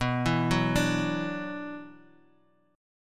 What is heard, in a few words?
F sharp 7th Suspended 4th Sharp 5th B